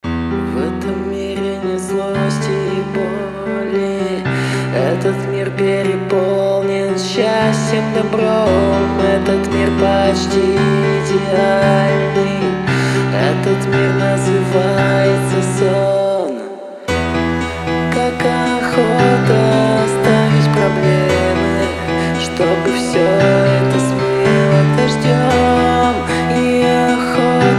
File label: acoustic